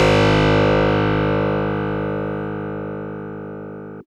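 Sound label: Fuzz Clav DX100 G#0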